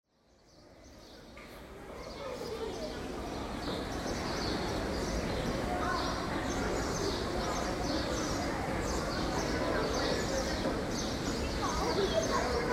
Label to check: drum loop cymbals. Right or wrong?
Wrong